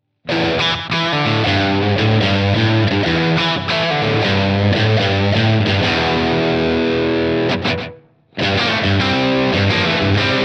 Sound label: CRUNCH